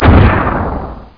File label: miss; PC Gamer IT CD 31; ARMRCMD